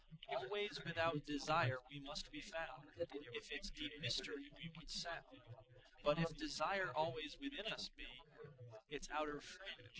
male inst src

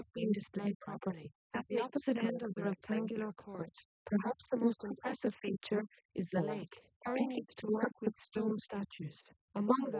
female inst; SiSEC10; underdetermined

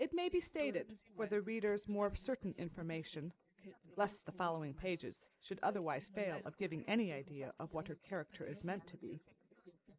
female inst sim

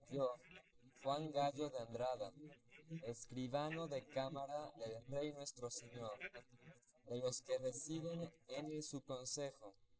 male inst sim

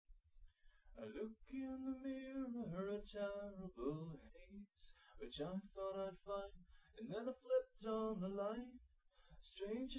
nodrums liverec 250ms 1m sim; SiSEC08; SiSEC underdetermined

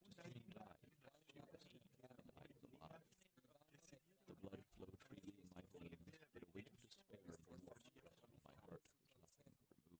male inst sim; SASSEC07

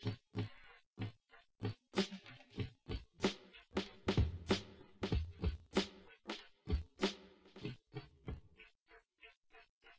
wdrums inst sim; SASSEC07

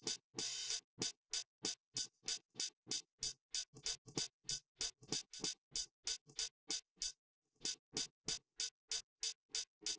drums

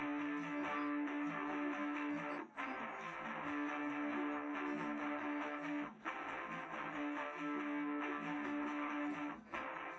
drums liverec 250ms 5cm; SiSEC11; underdetermined

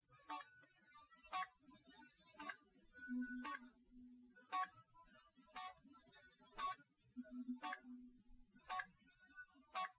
nodrums, liverec, src; SiSEC08; SiSEC underdetermined

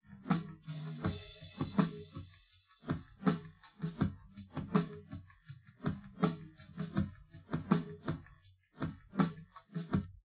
drums; SiSEC10; underdetermined

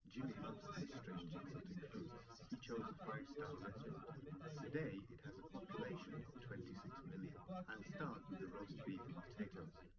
male live rec 1m sim; SASSEC07

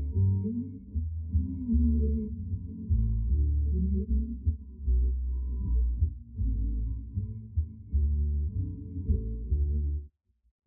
Bass; ICASSP11

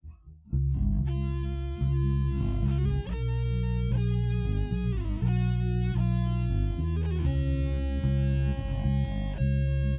nodrums inst sim

